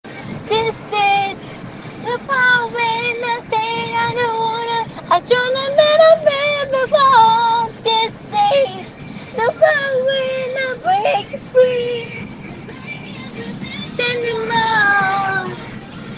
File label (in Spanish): cantando